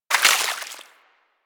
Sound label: Bullet Impact Debris Water